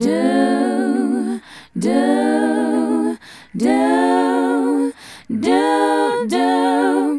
Female Group, Hip-Hop and RnB Vocals

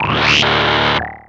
FX, attacks

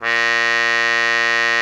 cup A#2, cup mute; Xsample VOL-3

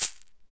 SHEKE, Afro Shakers, Shekeres

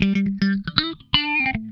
Funk Guitar; Zero G - Funk Guitar

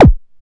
BD ANALOG; Sound & Vision - Gigapack II CD 2

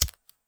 Lighter; Hits

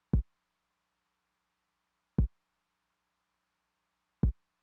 kick drum, Roland TR-909; drum machine; Roland TR-909 Ableton Project

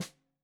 Snare 65 Reg Stereo, Deeply Sampled Drums

